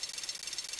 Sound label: thanatos draw; thanatos1